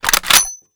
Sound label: bolt back; albanyknight; css